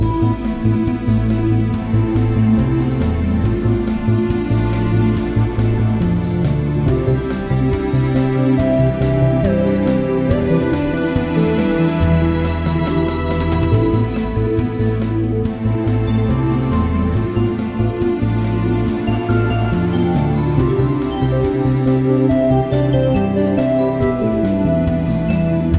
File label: comlink urgent; Spiral Knights